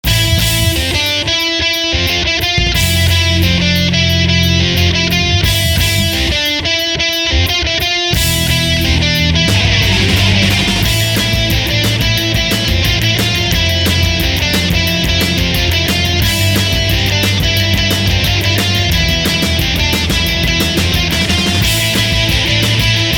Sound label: Bass Guitar; scene music